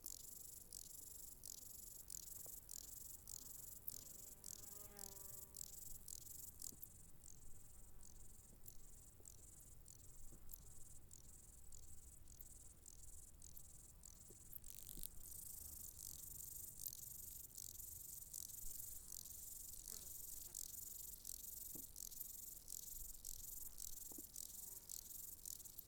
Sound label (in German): Heuschrecken LOOP